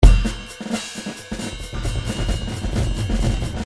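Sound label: drums jazz pattern; Paul Kodish Dangerous Drums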